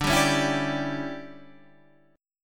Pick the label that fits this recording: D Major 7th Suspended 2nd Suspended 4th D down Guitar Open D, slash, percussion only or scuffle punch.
D Major 7th Suspended 2nd Suspended 4th D down Guitar Open D